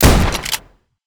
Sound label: Shotgun Fire Pump